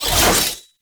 Whoosh, weapons; Broken Frame Warfan; Quassus Prime

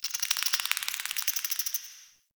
Interior Clicky Critter; Ambience; Indoor; Warframe; Infested Eris

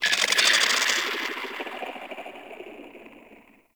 Tendril Tree Creaks, Infested; Ambience; Props; Warframe; Infested Eris

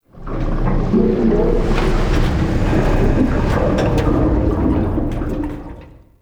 Metal Pipe One Off; Ambience; Props; Warframe; Entrati

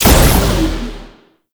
Shot; Warframe; Weapons; Strun; Strun Prime

